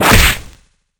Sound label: Punch